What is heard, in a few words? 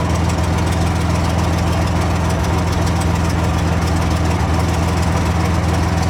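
Plane P40 Interior Idle Loop; Airplanes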